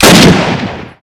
garand shoot, weapons; svencoop; ww2projekt

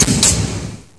join clack; filtration

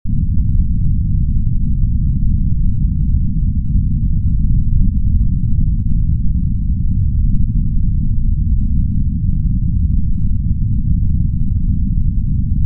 space ambient; svencoop; sectore

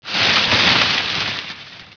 leaves

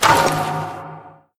steampipe draw; weapons; deluge

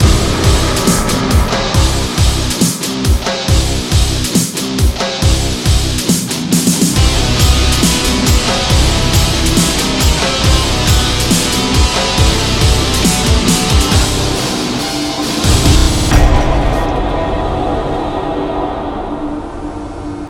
loading loop, music; morass beta

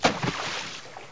splosh

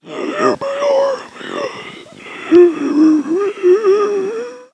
zombie idle; mystic radar; mr zombie1

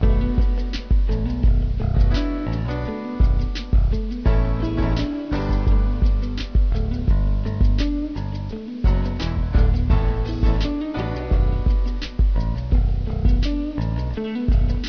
funky music

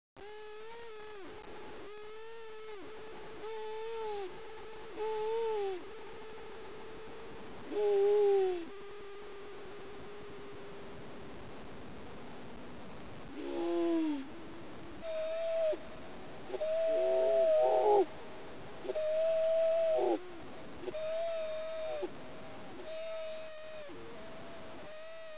seagull

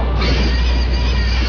vortex; de stargate

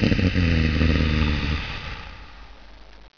mummy idle

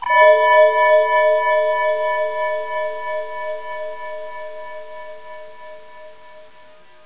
mistery

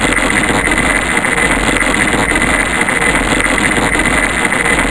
dead sign; csde cuba

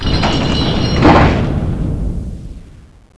close near; dm clocktower

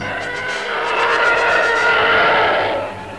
fly by; tie; star wars trench

